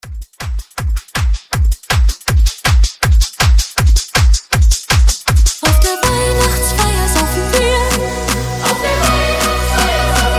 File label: Extended Redrum 160 BPM